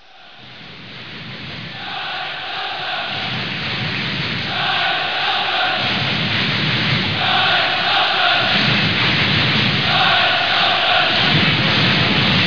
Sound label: betze roar